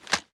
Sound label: reload fast end; gmod; viper